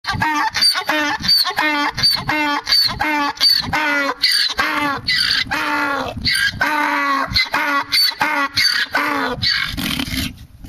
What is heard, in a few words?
Donkey